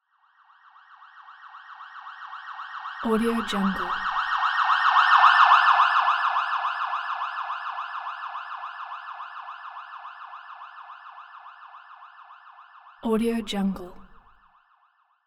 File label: fire truck siren pass by; prosoundfx